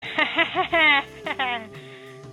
hehehehehehe

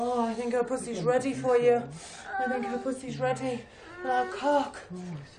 moan

